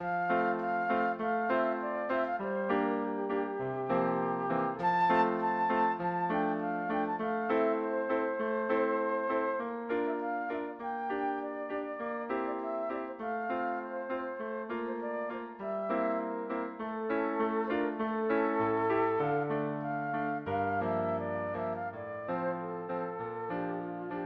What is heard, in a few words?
flute